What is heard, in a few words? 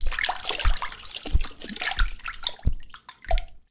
Aquatic Foot Steps Dynamic Aquatics